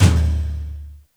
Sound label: acoustic tom single hit d key, tom drum sound tuned to the D note. Loudest frequency: 645Hz